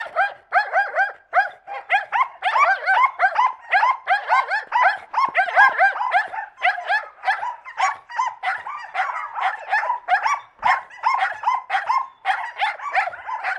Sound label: small dogs barking